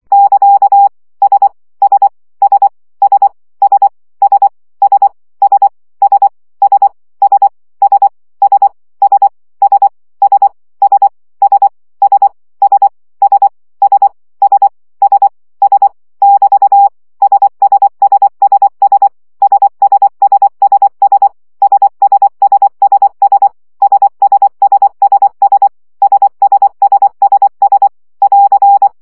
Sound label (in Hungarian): CW gyakorlas s 20 baud